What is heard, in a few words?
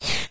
science minimize, windows 9x sound schemes